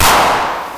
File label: SUPER CLAP, PHUZZ DRUMS; Spectrasonic Distorted Reality 1